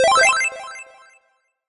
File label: gem, snake; tetris window